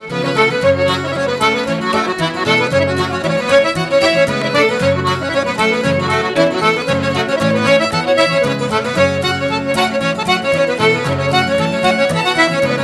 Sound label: Reels